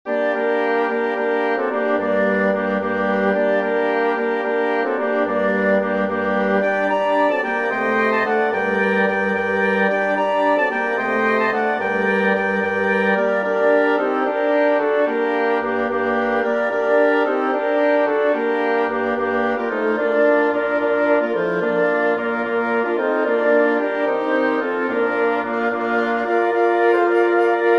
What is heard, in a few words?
forlana for woodwind quintet; carnaval venise